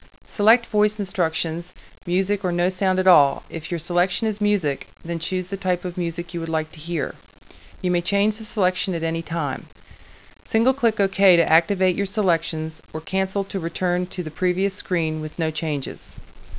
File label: music opt; Resorts of the Caribbean